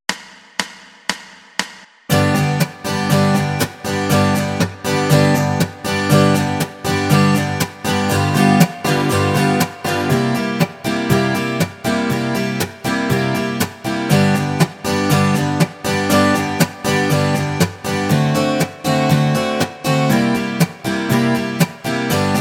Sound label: Riffs G